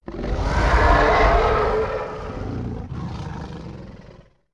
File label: Monster Eerie Growl